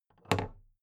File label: big plastic case handle bounce regular; objekte; kiste